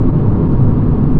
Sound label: tarmac; surfaces